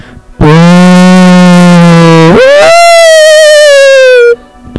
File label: screams BOOOOOO!!!! into the speakers